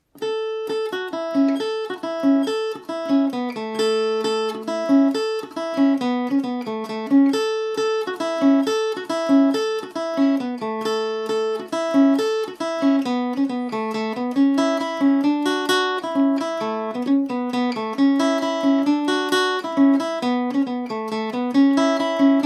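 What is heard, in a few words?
A major at 40, irish mandolin; mickey chewing bubblegum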